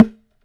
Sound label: Thunder Drum